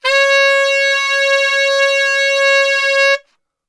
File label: C#3 SAX STR, Brass; Best Service; Brass Super Section